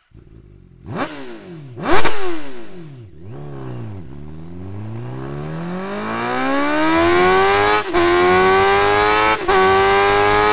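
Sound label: evolution w o insert, exhaust; akrapovic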